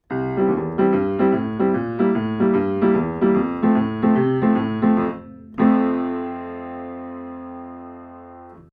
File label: Schoeps CMC6 MK4 ORTF Piano